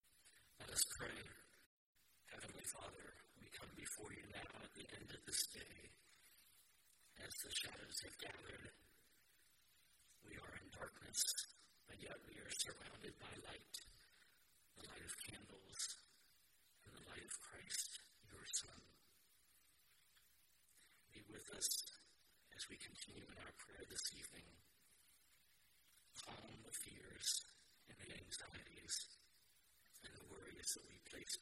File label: Taize Prayer